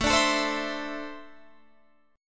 D 7th Suspended 2nd, chord

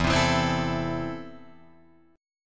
E Suspended 4th Sharp 5th, Guitar Drop D

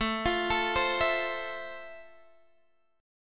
F Major 7th A

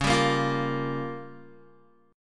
Db 6th Flat 5th Db down Guitar; Db6b5 strummed; JGuitar

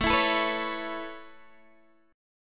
A Major Add 9th A Guitar DADGAD, chord; 19,19,19,16,16,x; Aadd9 strummed

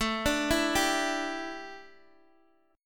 A 7th Suspended 4th Guitar DADGAD, chord

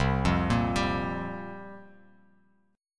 C 7th Suspended 4th, Bass Standard; C7sus4 arpeggiated

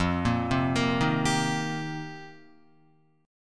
F 7th Suspended 2nd Suspended 4th, Guitar; arpeggiated

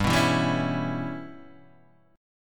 C sharp Minor 7th Flat 5th G

